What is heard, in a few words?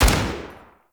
Rifle Shot; Warframe; Tenno; Sundial Rifle